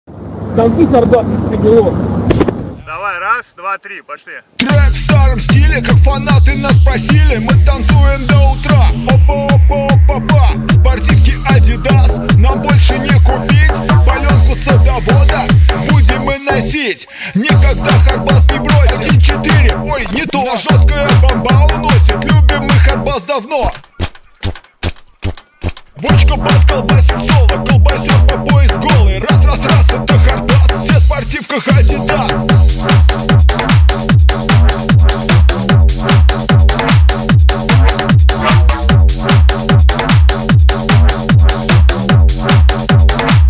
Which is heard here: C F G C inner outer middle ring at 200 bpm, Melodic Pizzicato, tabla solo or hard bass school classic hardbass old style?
hard bass school classic hardbass old style